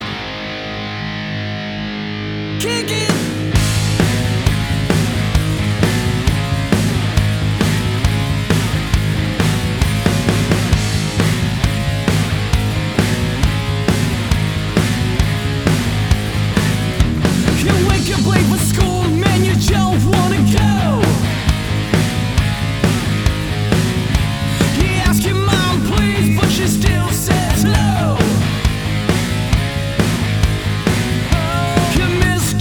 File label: Cover Band; Orlando